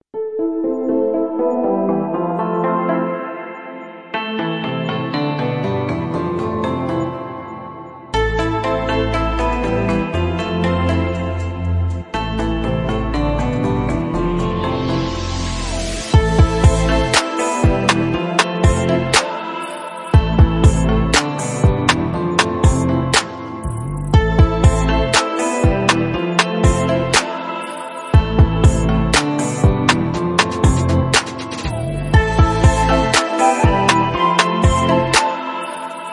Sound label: Background hip hop urban dance in the morning